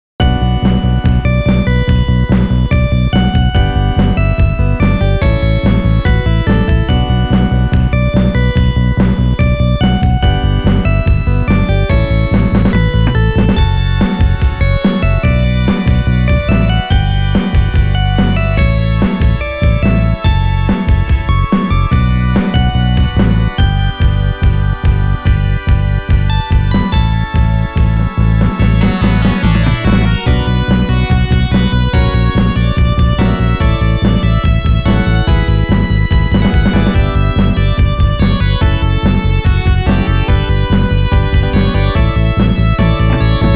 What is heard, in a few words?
All Clear; Raiden; The Lightning Strikes Back